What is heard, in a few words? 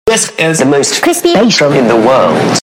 Crispy Bassdrum